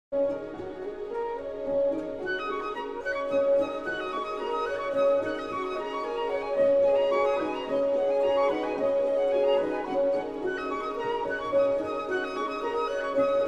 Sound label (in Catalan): Orquestra; Ravel; Auditorio De Galicia